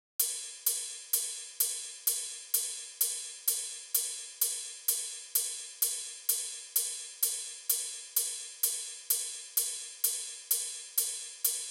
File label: 128 BPM Ride; Vengeance Essential Clubsounds Vol.5; VEC5 Demo Constr Kits; Slide Kit; Root G; Drums & Perc